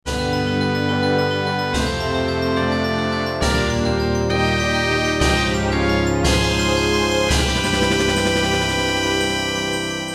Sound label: Medal Get Fanfare; The Legend of Zelda Ocarina of Time